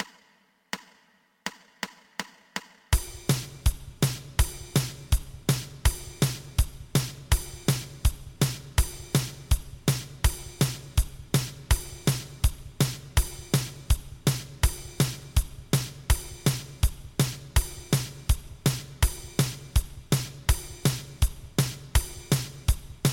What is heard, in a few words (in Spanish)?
2 pulsos